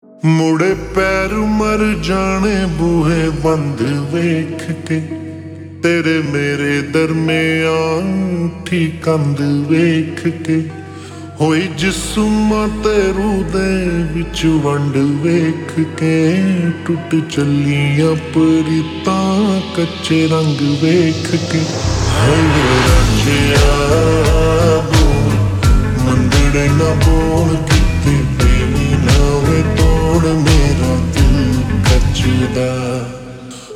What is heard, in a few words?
Ringtone Slowed Reverb